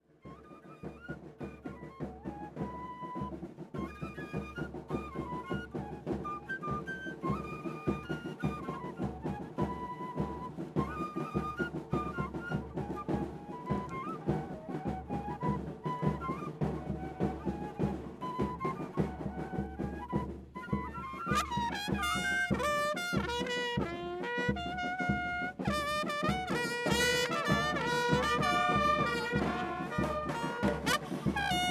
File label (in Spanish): Muestra de Waynu